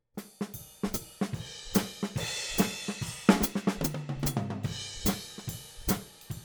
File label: Hat